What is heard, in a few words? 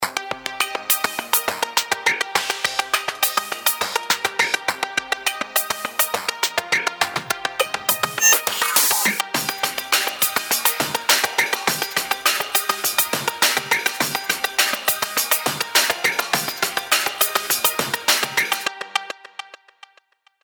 ARPY, Ring Tone, Mono; HTC; E-Club Ring Tone Pack 2